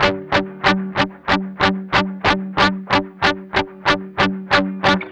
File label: MED ROCK 8TH G#3, Guitars; Spectrasonics; Hans Zimmer Guitars VOL-1; 102-MED ROCK